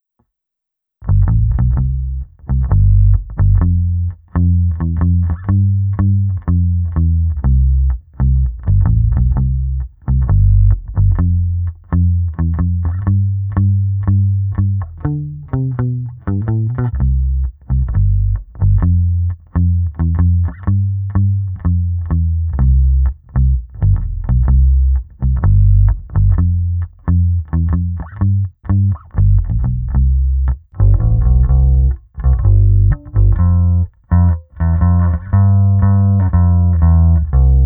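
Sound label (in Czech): Krkový snímač s tónovkou na půlku, Jazz Bass; Sadowsky NYC Ultra Vintage J Bass Guitar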